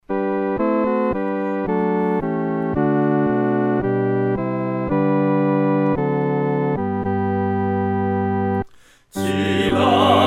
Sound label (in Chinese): 合唱 四声部; 齐来崇拜